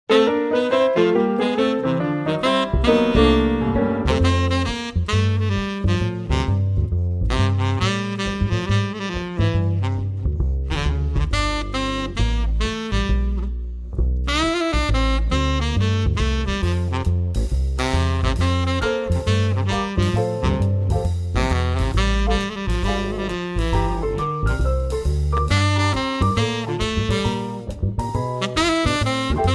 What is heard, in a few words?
Instrumental